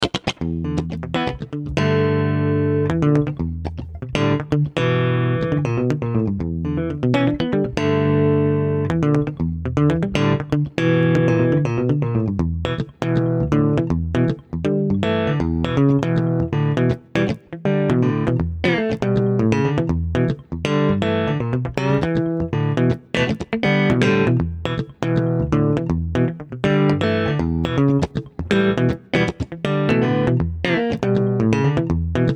Funky Clean Solo, Jazz Guitar

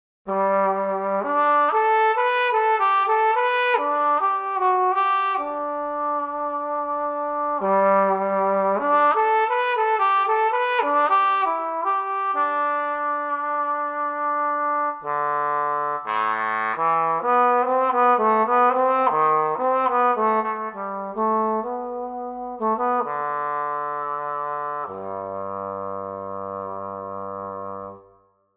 Trombone Straight-Mute Legato

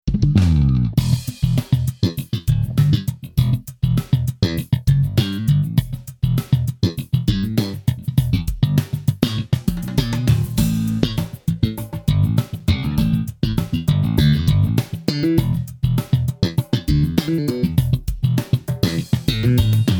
E-Bass Slap

